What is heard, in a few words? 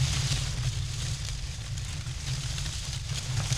palm tree; Soldier Of Fortune II Double Helix; XBOX; ambience